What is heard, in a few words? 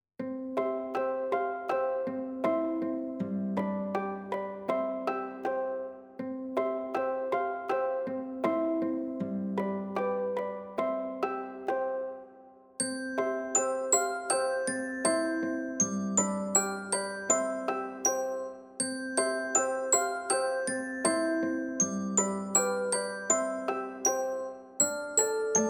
Karaoke